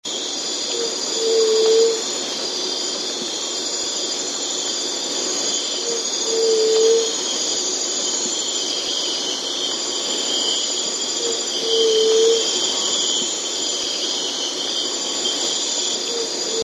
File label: White tipped Dove